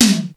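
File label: tom high; xr10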